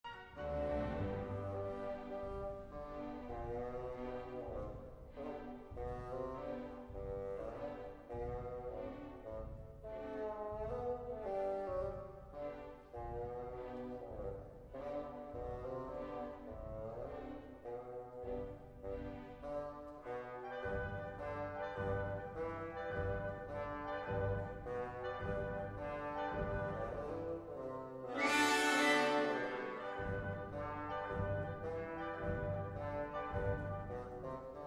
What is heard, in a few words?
drunken bassoon